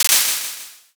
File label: clap